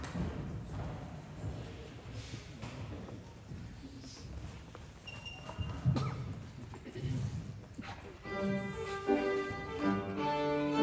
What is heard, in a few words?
Viola La Provencale